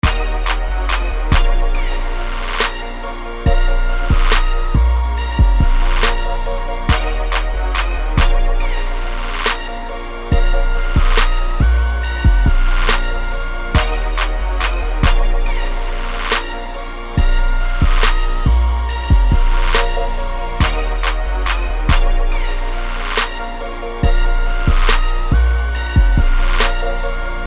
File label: Trap